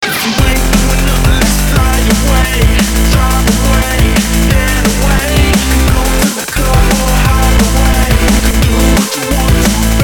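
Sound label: Remix na zvonok, ringtone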